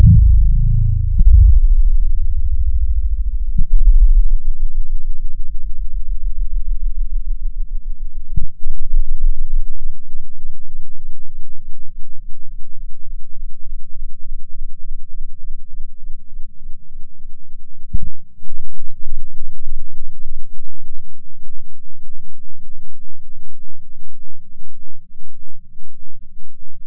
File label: Studio Bass; Instruments